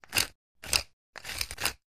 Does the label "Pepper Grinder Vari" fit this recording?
Yes